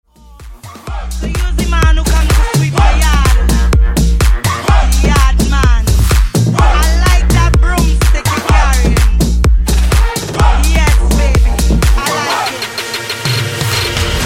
Funk Top 40 Mash Up 126 Bpm

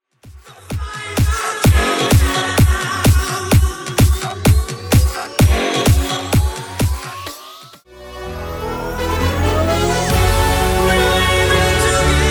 Festival Bardrop 128bpm CLEAN

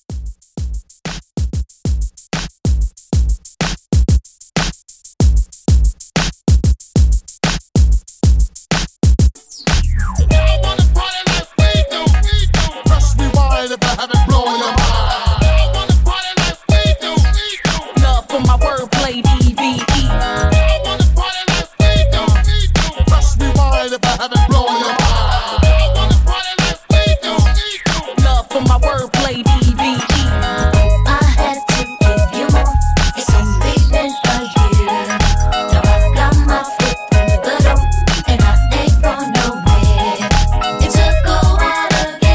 Dancehall 94

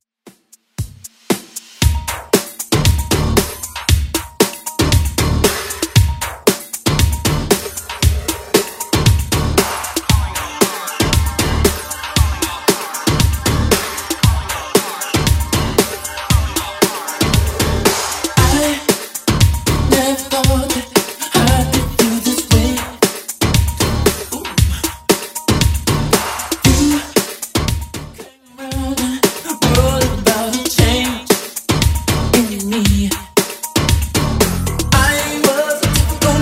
80s Redrum 116bpm

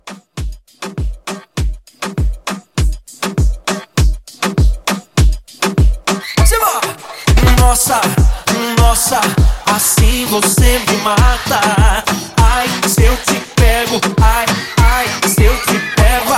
Moomba Re Drum 100

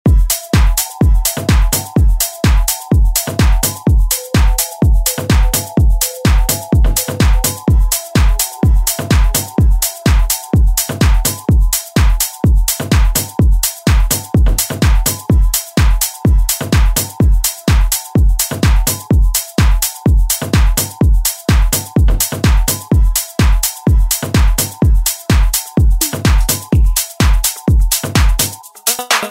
Tech House; Deejay Hit

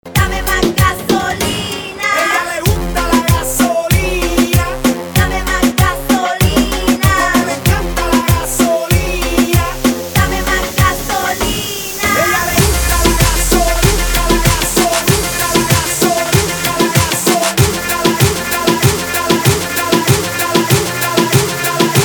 Club Party Banger

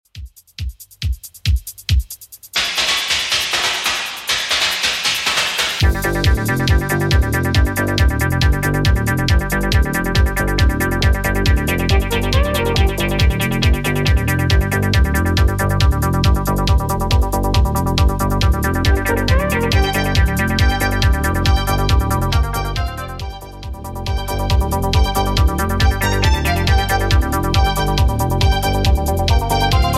80s Redrum 138bpm

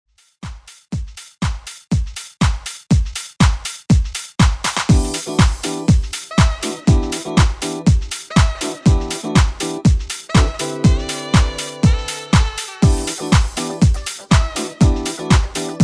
90s Pop Redrum

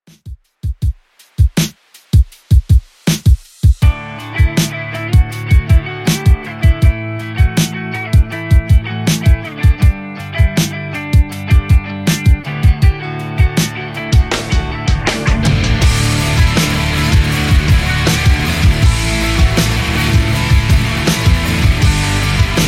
90s Alternative Rock Redrum 80bpm

